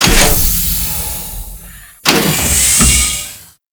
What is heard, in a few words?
Forest Storage Drum Start; Props; Warframe; Grineer Forest